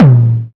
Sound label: tom drum one shot a sharp key